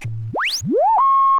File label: phase three, Zaps and Beeps